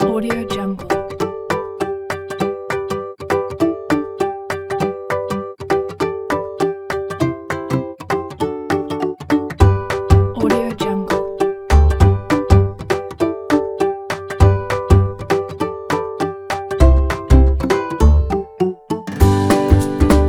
cooking music

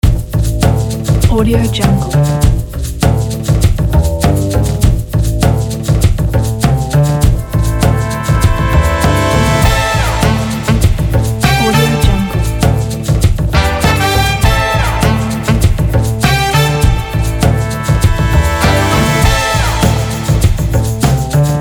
brass jazz funky groove

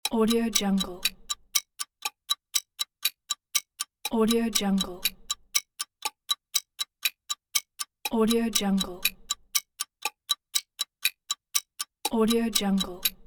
clock

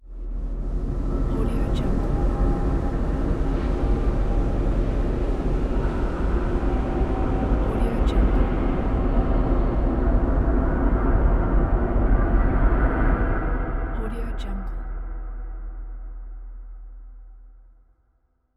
dark ambient horror

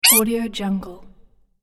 arcade game slide squeak

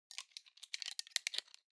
object plastic frame small mechanics rattle

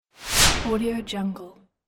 swipe sound

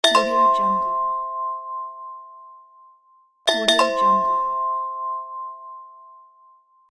idea indication

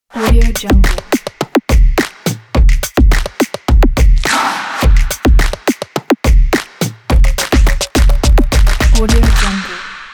stomps sport beat

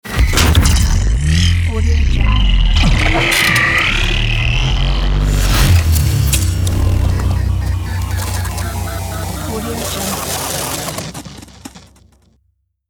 morphing transform

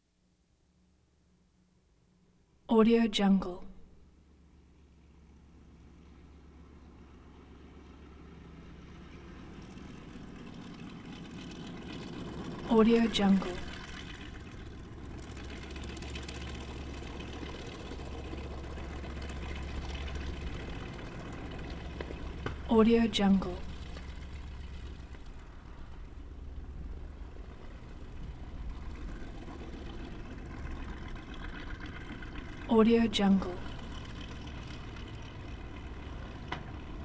b25 mitchell twin prop war bomber taxi in slow; prosoundfx

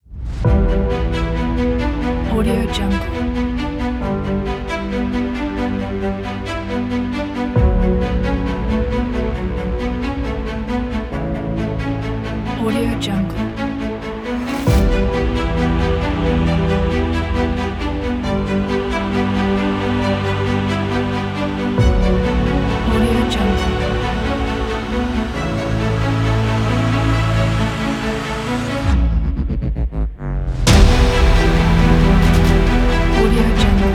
adventure orchestra trailer; madbirdprod

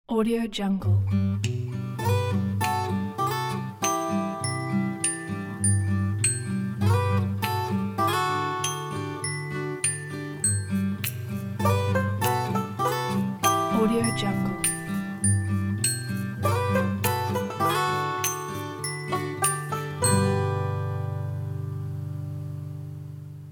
sweet home intro ident; red-apex-records

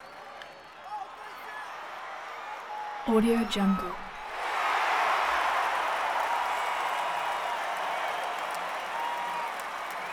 baseball crowd big cheer applause whistles

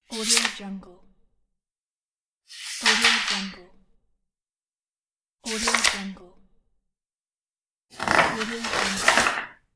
book page flipped

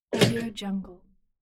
toaster

